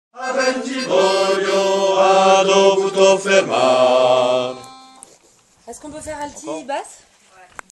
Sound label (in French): basse 2